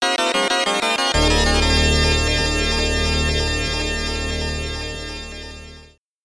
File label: Level Completed!; The Chaos Engine; Amiga